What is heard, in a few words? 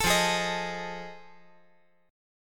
F 7th Sharp 9th F